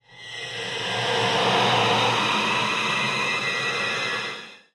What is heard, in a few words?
Screamy Screetchy; soundscrate